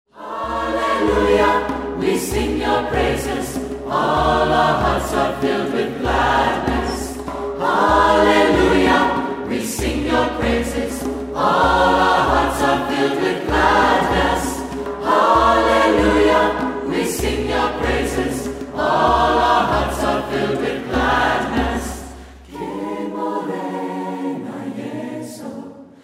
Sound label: hallelujah pelo tsa rona